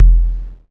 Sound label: Kick, Drums; Bitkits